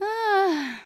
zhenskij vzdoh oblegcheniya

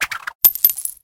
korotkij vzvinchennij zvuk upavshej moneti

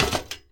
zvonkij korotkij zvuk upavshego zheleznogo vedra na zheleznuyu poverhnost

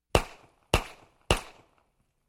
vistrela iz kalibra

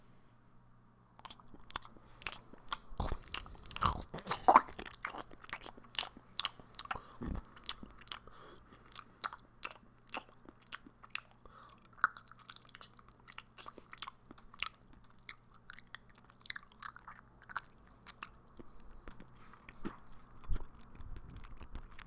eda super blizko

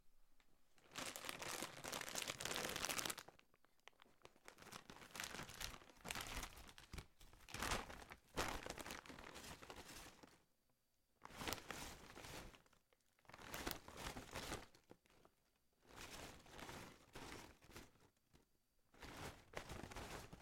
zvuk meshka s chipsami

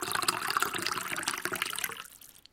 voda odinochnii burnii myagkii

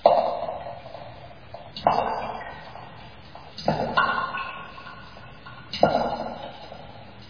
voda odinochnii blizkii v prostranstve

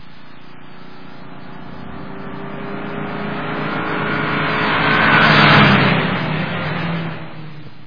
avtomobil proehal mimo na bolshoj skorosti

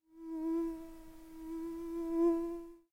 zatyazhnoj nadoedlivij pisklyavij pisk komara

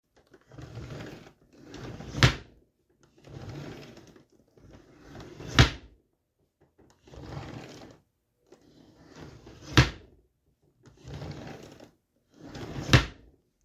yashik otkrivaetsya i zakrivaetsya

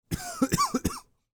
korotkij kashel molodogo muzhchini

